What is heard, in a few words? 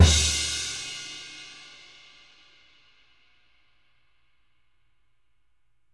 Living Drums, JZ CRASHES; Sampleheads; Peter Erskine